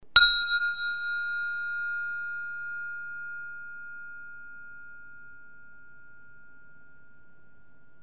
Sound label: steel